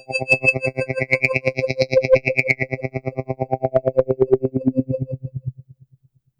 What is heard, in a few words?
Trance, YAMAHA RM1X